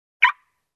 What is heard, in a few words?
Car Lock Single